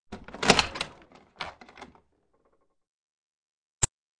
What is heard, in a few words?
Track, Doors; 헐리웃특수효과음; 문 소리